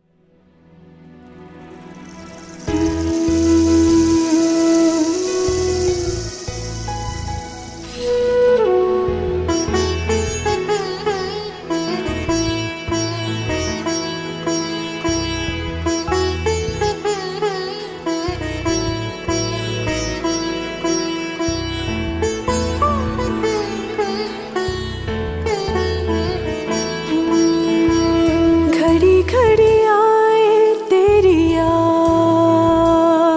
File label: acoustic